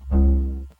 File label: downer; Tivo sounds